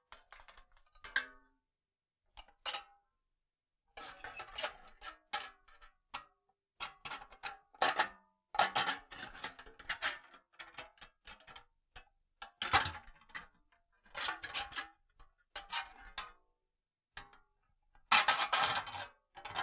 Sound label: Can Shards Scrape, Drinks Can